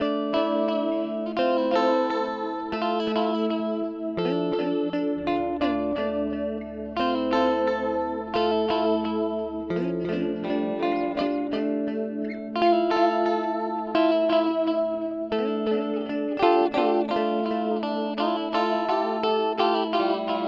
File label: Mooer D7 Liquid Delay